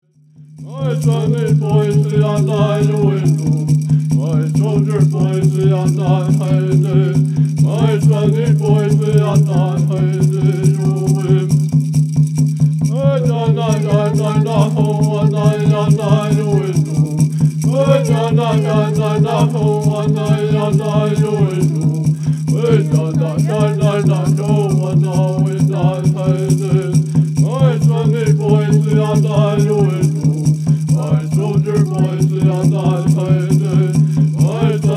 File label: Peyote Healing Song